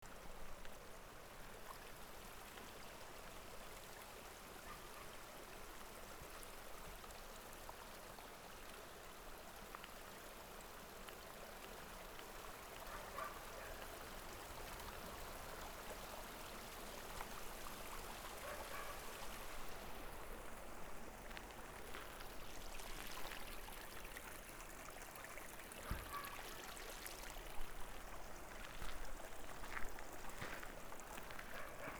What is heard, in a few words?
stream walk